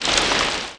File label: splash; V-Rally